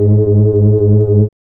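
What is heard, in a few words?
synth chord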